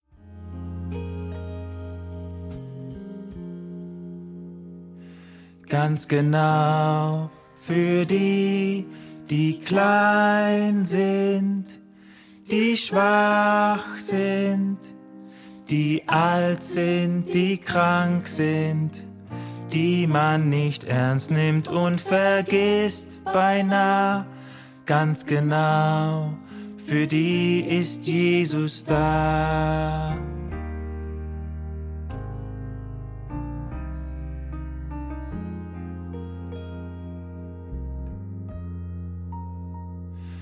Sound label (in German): Lern Bass